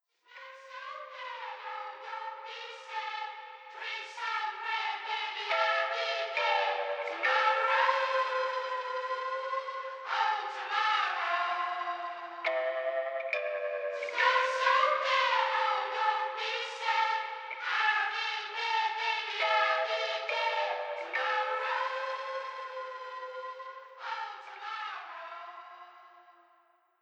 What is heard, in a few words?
Music Layer Only